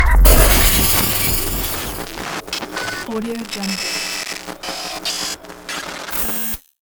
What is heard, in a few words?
utilities tazer